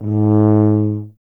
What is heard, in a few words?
BRS TUBA, Solo Instruments